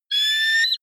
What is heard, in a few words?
offspring eagle call, voegel; natur tiere